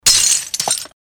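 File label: glass break crash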